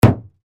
Impact Dull Hollow Hit; Low Impacts (Sound Effects:Impacts); Blastwave

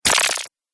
Alien Wet Element; Alien Sound Effects

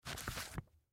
Pamphlet Close Cover; Office; Blastwave

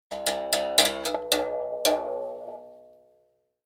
Berimbau, Playing simple music on a Berimbau.; Short Version; A Berimbau is a single-string instrument from Brazil; Concert Sound Effects

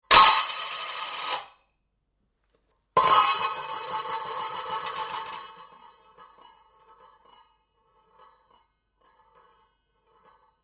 Impact Saucepan Lid Dropped, Onto stone floor x 4; Drops; Objects

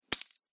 Coins Impact; Money Sound Effects

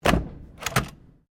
Stapler Large Operate, common office stapler; Staplers

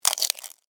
Insect Eat Chew, Bite, Crunch, Bug; Animal; Insect Eating; BlastWave

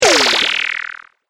Plasma Gun Scifi Attack, Laser Gun

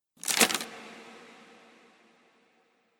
Monastry Manuscript Paper

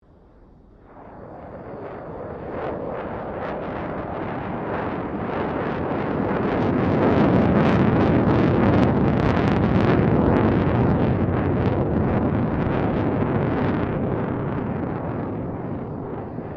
AIRCRAFT F16 FALCON MANEAUVERING

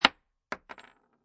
Bullet Shell Drop Machine Gun Solid Wood; Machine gun empty shells fall on solid wood; Lynne Music

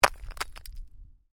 Ice: small chunk of ice lands on hard surface; Snow; Stuart Duffield

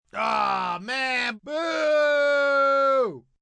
Boo Ah Man Boo, Booing, male

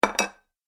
Medium Side Plate Placed On Dinner Table; Dishware Sound Effects; Household

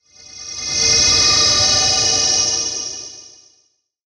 Whoosh Slow Filter Dark; Blastwave

